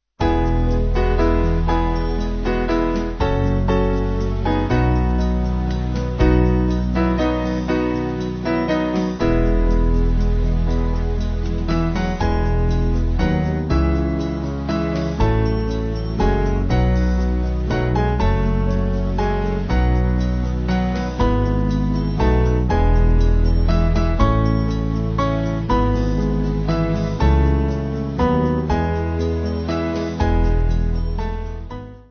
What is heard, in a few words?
Band